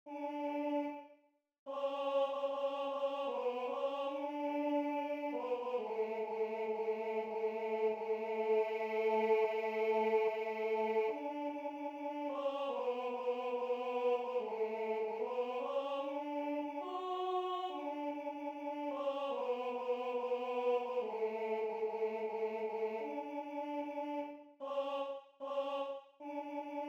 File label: Tenor II, test; Die Krot